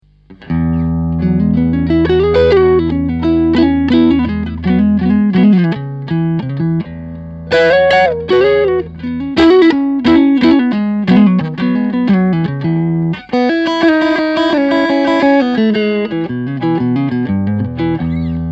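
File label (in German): low input kein boost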